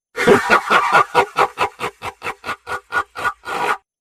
mad laugh; Tiberian Sun Firestorm; CABAL

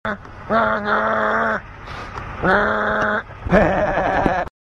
hehehe; ice poseidon

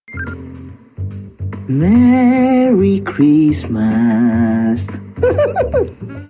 text tones christmas; saeran

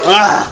uaaah

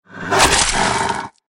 wolf growl

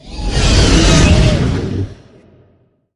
dragon killmove; npc

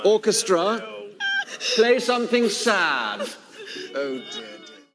orchestra

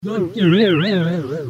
vibrato

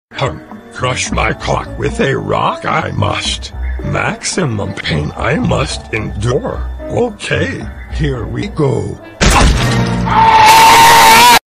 yoda screaming funny

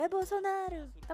choke

